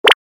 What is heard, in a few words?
cursor